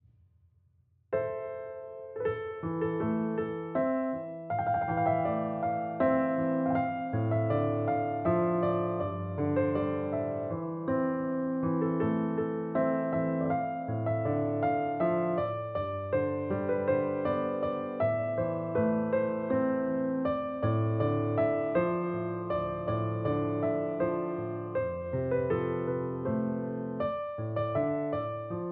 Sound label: piano, sadeh